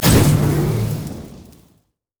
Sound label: explosiveturret; LoL; heimerdinger